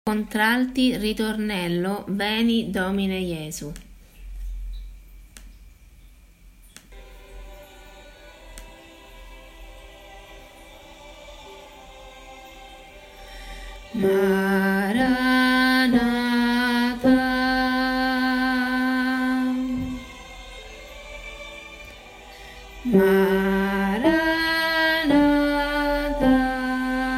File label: Veni domine jesu, Ritornello, Contralti